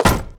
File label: iron run